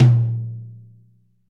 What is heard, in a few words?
wet tom one shot a sharp key; tom drum